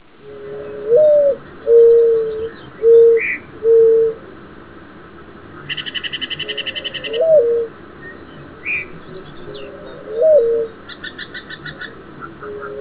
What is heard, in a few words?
Mourning Dove